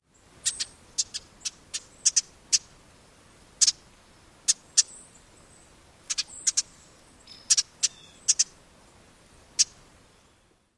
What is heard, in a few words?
jit and jidit calls; NY